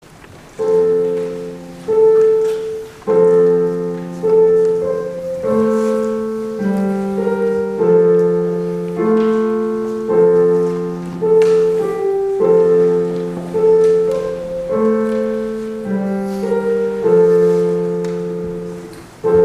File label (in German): Gloria Klavier; Adventsmusik